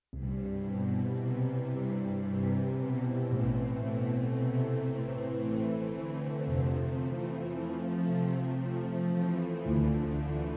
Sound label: melody ww backing wet